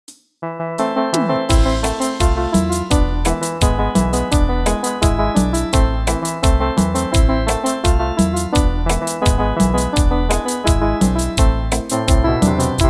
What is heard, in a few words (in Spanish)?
Cumbia